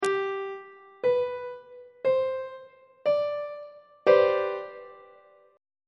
c major 7th suspended 2nd 2nd inversion on treble clef